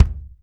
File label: Kick, Alesis DM5